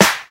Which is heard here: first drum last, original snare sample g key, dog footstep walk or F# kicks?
original snare sample g key